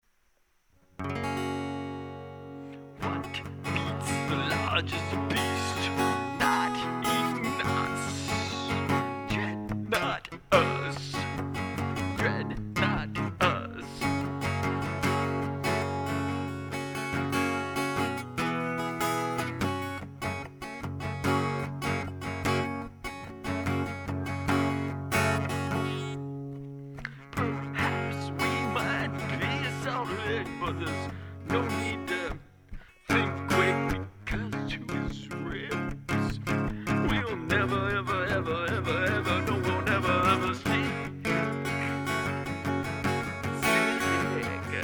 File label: Interlude